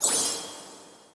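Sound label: Battle Card Appear; Disney Star Smash; Android, iOS, Mobile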